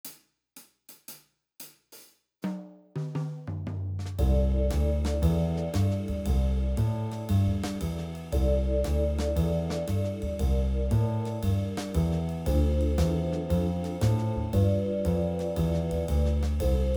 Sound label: Walking Bass Backing